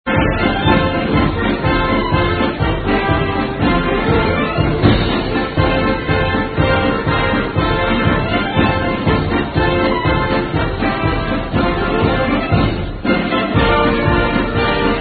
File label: Marine's Hymn [Instrumental]; 1960; tell it to the marines